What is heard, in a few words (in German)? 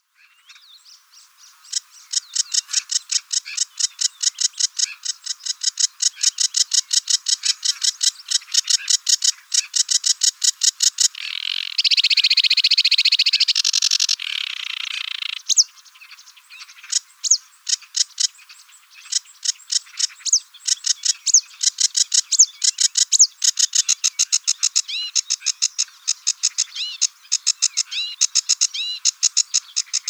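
Schilfrohrsänger Gesang; uni-kl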